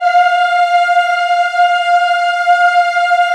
F4 women eh, Choir Acoustic & Synth; USB Soundscan vol.28; AKAI

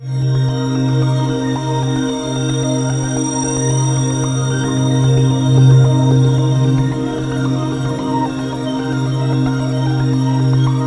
composite, ethereal atmosphere

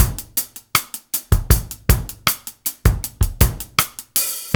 LOOP 79; AMG Gota Yashiki Groove Activator

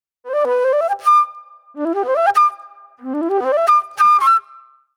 Soul-Jazz Flute, Call And Response

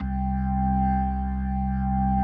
C2S, B3

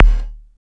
combidrums, techno; single-drums

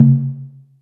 Conga f#3; EMU Orbit-3

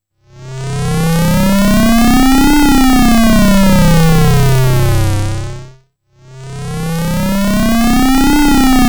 C1, Synth Line; 90 s Sample CDs; NiceBeats; SynthLine VOL-21

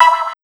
hit, big beat drumloops; USB Soundscan vol.25; AKAI; DJ 130

